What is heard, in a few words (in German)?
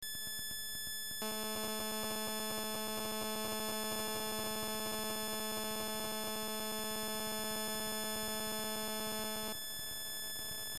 gsm organisationskanal mit einem verkehrskanal